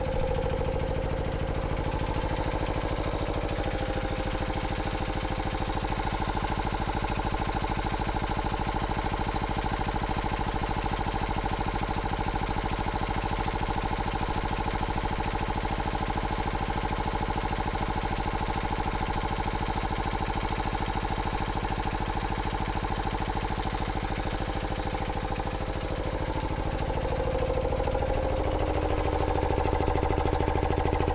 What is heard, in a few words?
Generator Pan